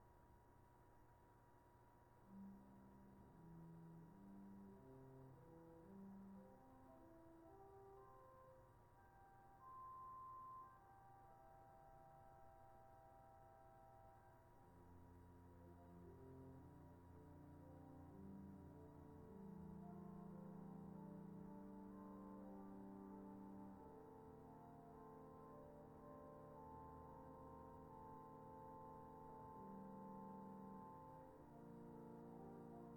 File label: swell aeoline, organ; 8’ Aeoline; The Aeoline is a string stop of very soft tone; the softest string tone in the